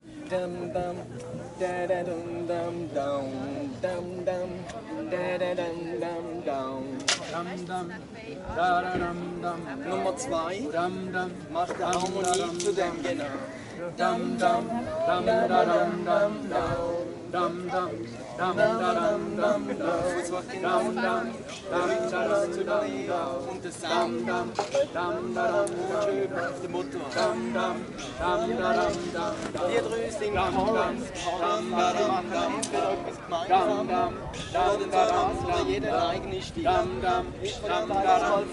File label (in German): Stimmimpro